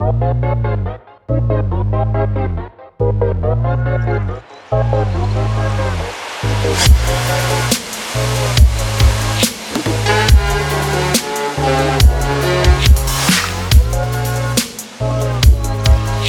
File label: Lo-fi Hip-Hop Beats; Rhythm Doctor OST